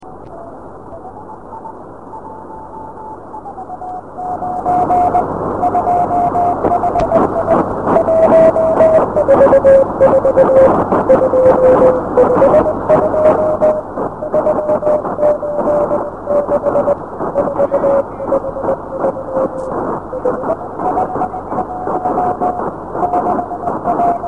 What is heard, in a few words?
ao07 beacon 145mhz975 with negatively keyed terrestrial beacon in background